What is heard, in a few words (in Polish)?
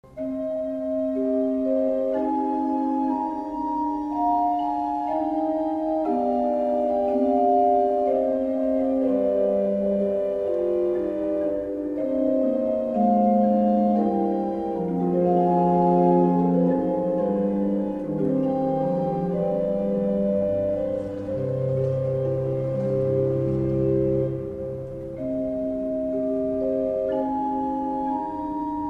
organy male